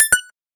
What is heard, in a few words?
Beep